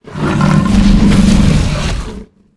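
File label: Hydra voice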